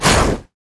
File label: basic attack dragon; LoL; shyvana